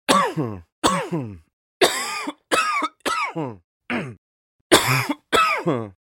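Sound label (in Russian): человек кашляет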